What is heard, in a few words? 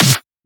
Snare; FLPRO